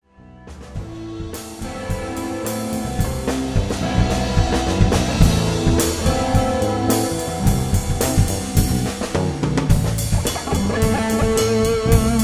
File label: Warmup Jam Snip; SmallBox